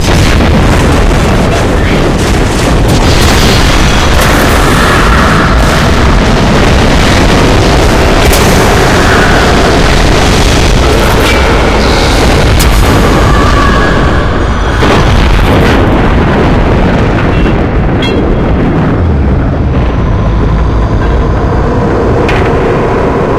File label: battle